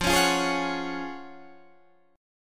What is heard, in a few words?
Gb Major 7th Suspended 2nd